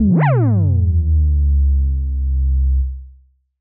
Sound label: Bass Puppy, techno drum fx; Single Hits